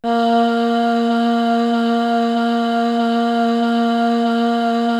cho a#3, Choir, KORG DSS-1

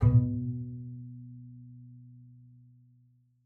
B2, bass pizz; Xsample VOL-5

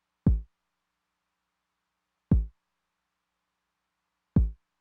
kick drum w accent, Roland TR-909; drum machine; Roland TR-909 Ableton Project

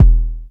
BD, Drums Dance & Groove

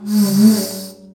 Tamorra, Roland BK-5 Percussion

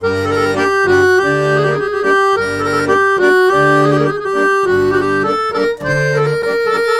Balkanish Traditions; USB Soundscan vol.63; AKAI

